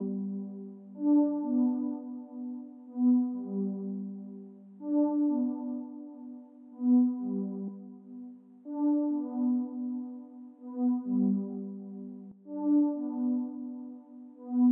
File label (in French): nappe douce break